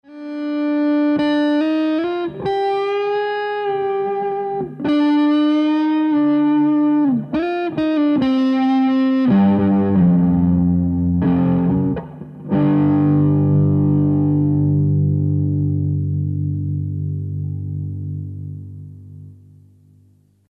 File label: Shine Drive